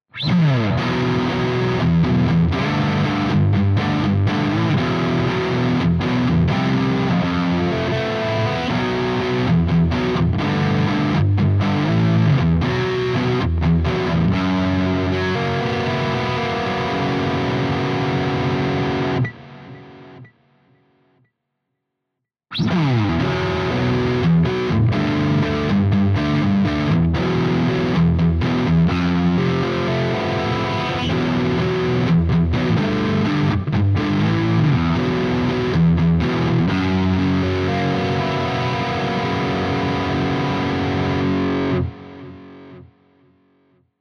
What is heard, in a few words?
JA 60 all positions Lead Licks